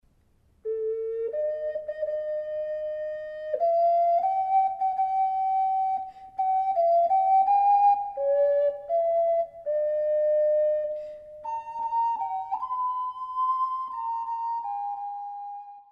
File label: O Tannenbaum, ocarina